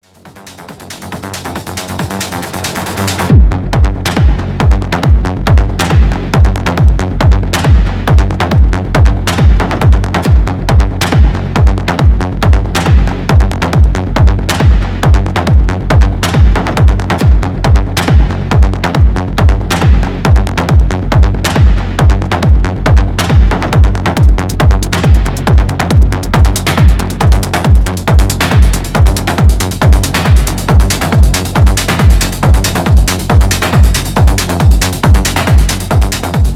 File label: ACID NOISE